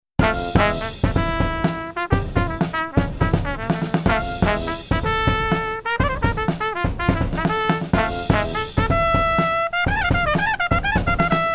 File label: Band Rehearsal